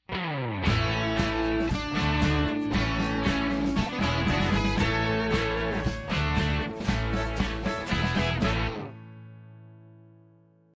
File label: intro stinger; bedtime treasure hunt